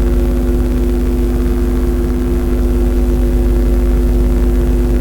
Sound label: gate ambient